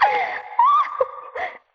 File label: laugh echo; Voice; ooh ahh